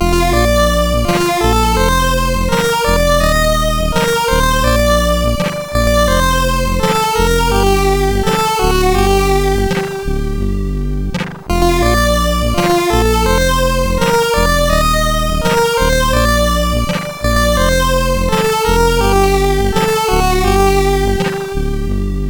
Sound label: Christmas Tune, MOS6581R4; sid